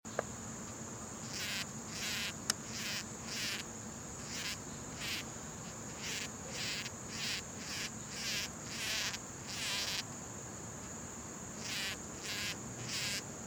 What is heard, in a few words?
Sphex nudus digging; thomas wilson